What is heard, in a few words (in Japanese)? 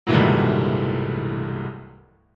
ショックな時のピアノの不協和音